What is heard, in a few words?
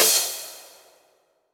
Crash, Cymbals